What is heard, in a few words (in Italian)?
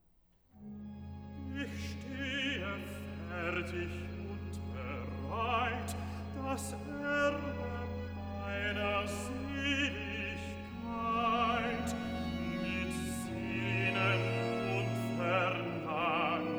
Cantata, Recitativo ed Arioso (Bass); BWV 56 'Ich will den Kreuzstab gerne tragen'; IV. Recitativo ed Arioso (Bass) 'Ich steh; Bach; Potsdam; 19th Sun After Trinity